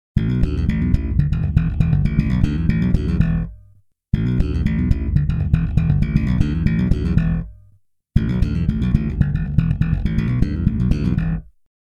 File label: JB neck bridge